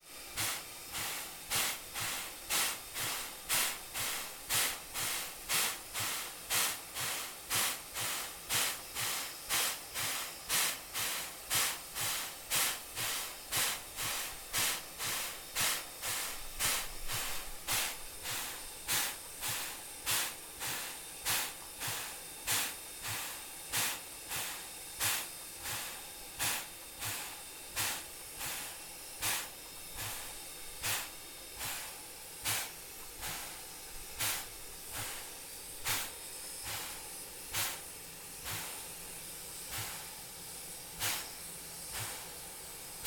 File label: locomotive parked